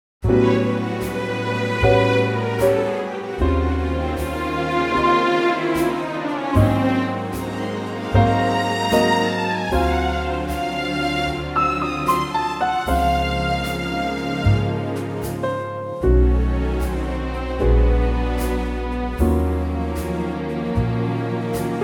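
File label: Db, backing track; key - Db - vocal range - Bb to C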